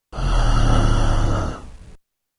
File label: breath